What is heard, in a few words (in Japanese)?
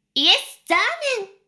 しこしこ音声, ASMR; 少尉; 牧場戦腺